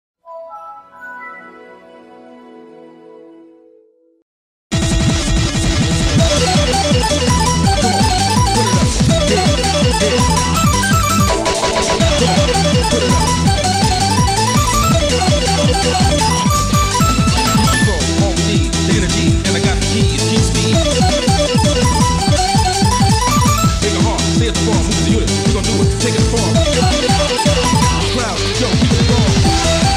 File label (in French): virus musique